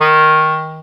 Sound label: Alto Clarinet Portato D#2; VarazdinOrchestral